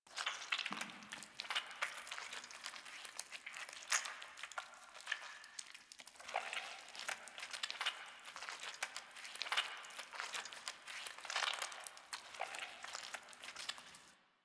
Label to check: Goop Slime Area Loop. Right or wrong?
Right